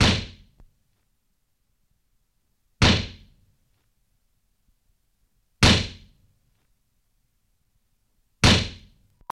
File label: Grenade Launcher, mortars